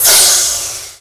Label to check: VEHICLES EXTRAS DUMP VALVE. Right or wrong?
Right